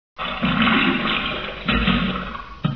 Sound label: splat